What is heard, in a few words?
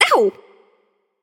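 ouch, female; smite; voicepack; hera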